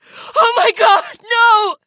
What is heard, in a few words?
impaling; ep2; Source engine